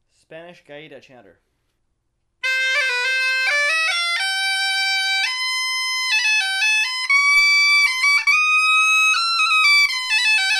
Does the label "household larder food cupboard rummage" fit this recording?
No